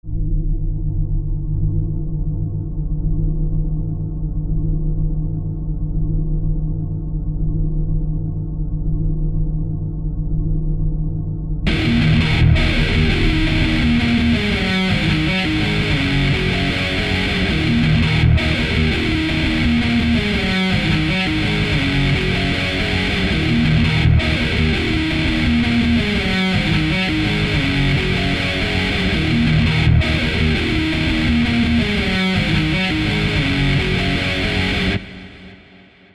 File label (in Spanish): guitarreo; Prueba de guitarreo